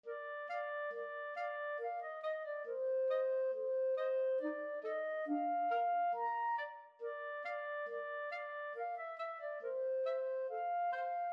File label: schubert rose, clarinet trio